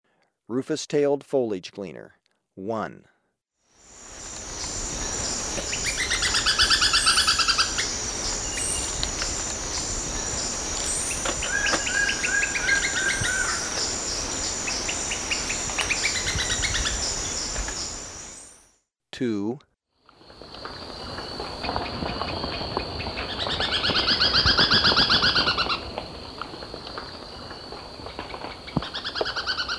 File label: Rufous-tailed Foliage-gleaner, Birds of Ecuador; S. America